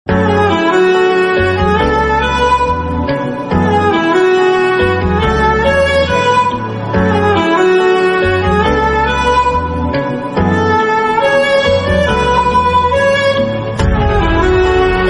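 sad background music love failure background music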